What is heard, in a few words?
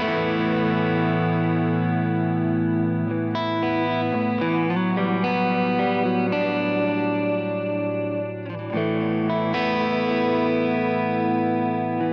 Big Sky Reverb Non Linear